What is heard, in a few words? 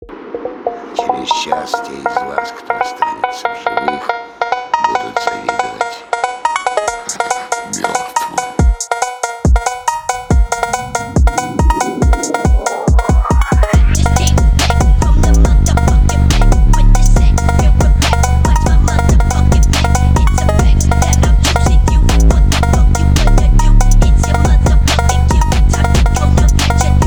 Dead Phonk